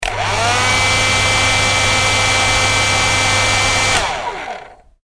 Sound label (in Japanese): ドライヤー